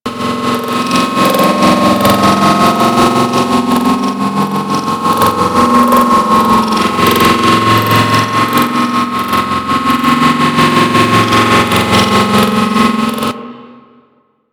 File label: Guild Energy Shelf Loop